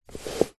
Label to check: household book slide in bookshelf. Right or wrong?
Right